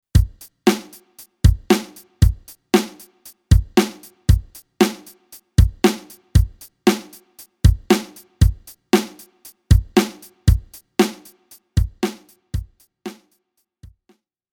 Zoom G3 drum machine; Zoom G3 drum sound

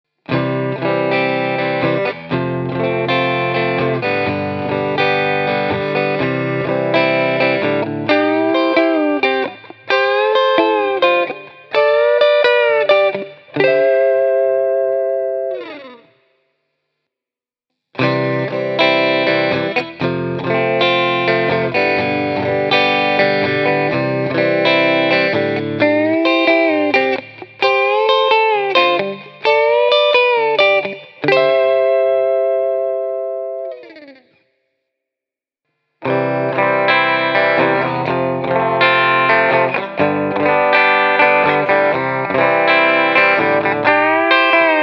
tokai tte 55 clean

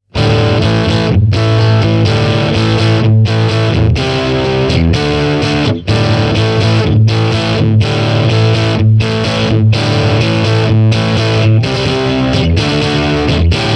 bluetone shadows jr shure sm57 distorted

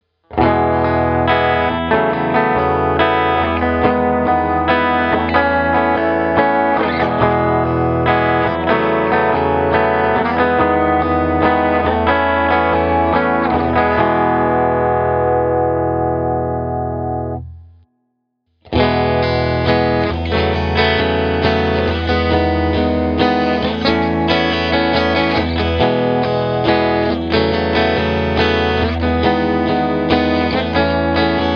Duesenberg Dragster – clean; both clips feature the ”neck” position first and the pure bridge setting last

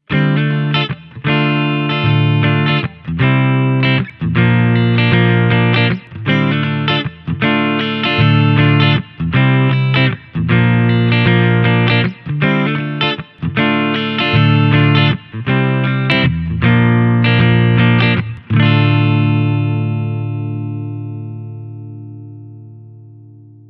blackstar ht metal 60 modern clean direct

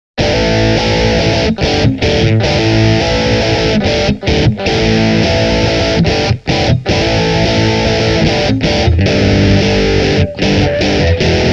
marshall dsl5c strat ultra line

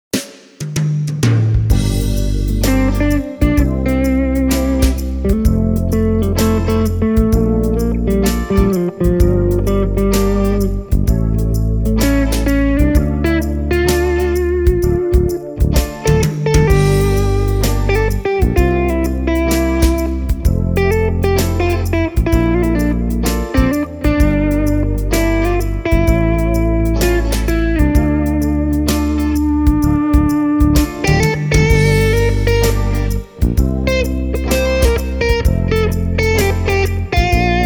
bluetone fried eye 22 combo; demo track

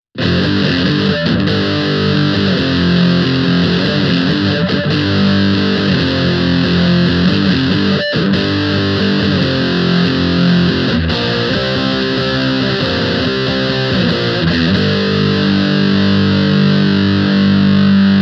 extreme effects off fender stratocaster; roland cube lite

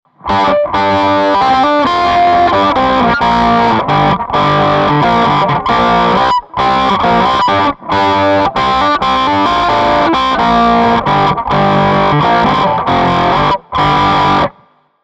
Tokai LC-85 – fixed wah – Marshall-type

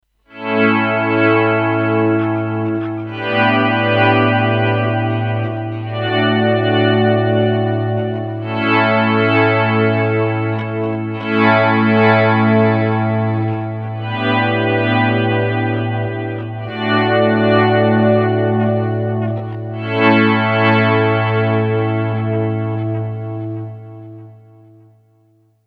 Fender Stratocaster – slow attack – delay

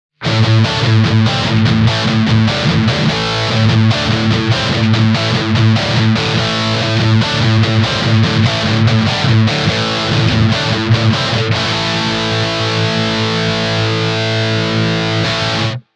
blackstar ht metal 5 od us direct

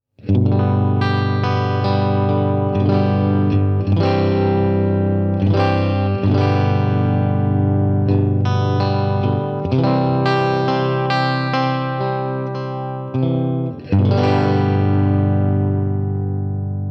bluetone shadows jr shure sm57 clean

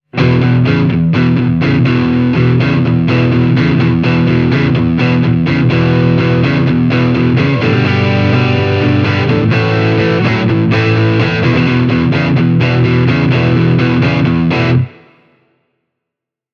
halla custom hallabird dirty

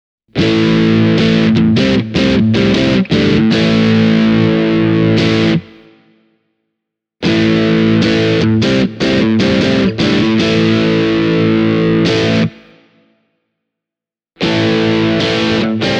Ogre Magnox M1 Ogre pus dirty

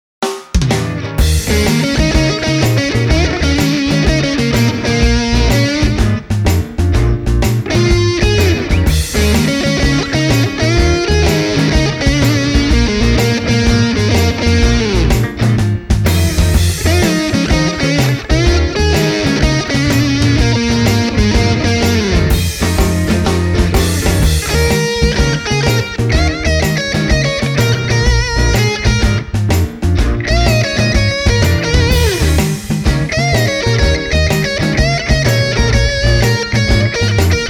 fender bassbreaker 18 30 demo song; valve amp

